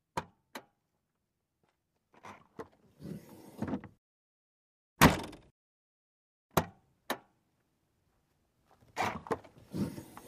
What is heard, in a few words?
Car Trunk Oc Cu Pop Loc, Car Trunk Open and Close. Cu, Pop Lock Handle with